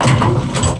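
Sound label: FLUSH MA 72 BPM; MACHINES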